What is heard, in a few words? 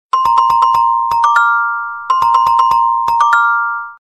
treo ringtone